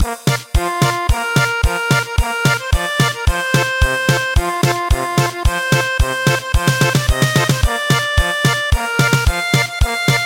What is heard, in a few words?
German Polka